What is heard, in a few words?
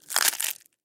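waffle sounds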